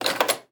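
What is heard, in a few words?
Hospital Room Interior; Medical; Blastwave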